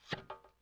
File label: Plunger Ploink Metal; Vintage Cartoon; Foley; Sony Pictures Sound Effects Series Volumes 1-10; Sony Creative Software